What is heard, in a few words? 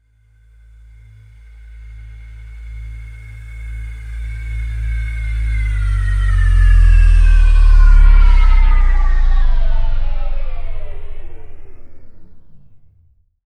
SPACESTA; AKAI Anime Sound FX